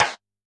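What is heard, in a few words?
Snare Stick; drum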